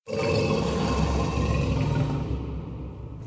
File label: lockjaw growl; trtf 5 tortured